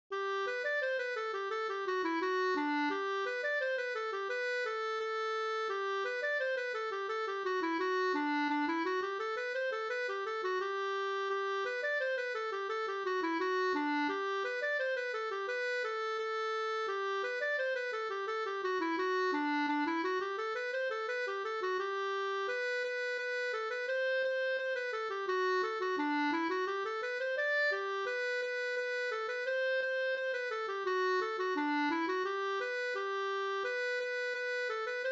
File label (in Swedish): engelska